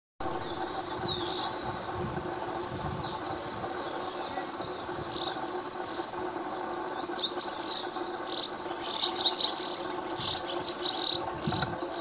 golondrina riberena

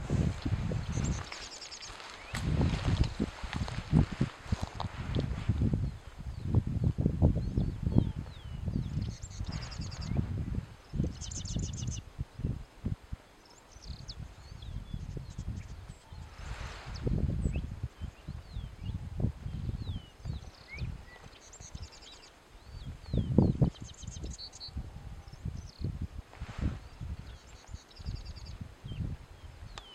guaipo

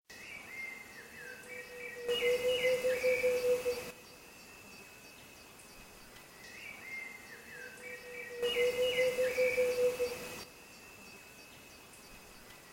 Chululu Pintado

